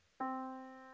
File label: Yamaha Disk, piano sound